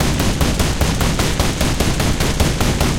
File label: loop kwk30, guns tanks